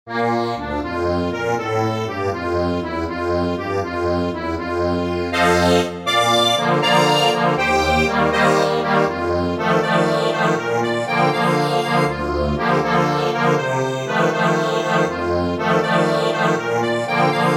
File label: accordion, sheet music